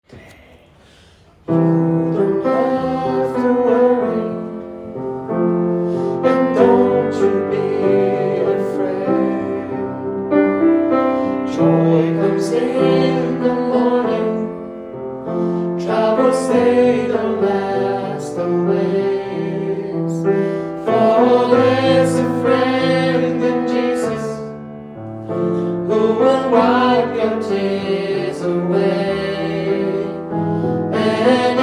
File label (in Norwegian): tenor, gospel; kongsberg; kor; gospel for alle